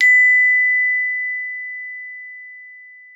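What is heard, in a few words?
GLOP B4, glockenspiel; Xsample VOL-4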